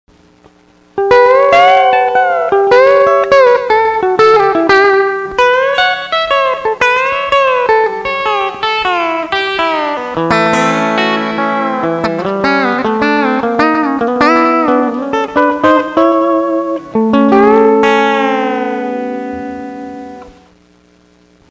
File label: bending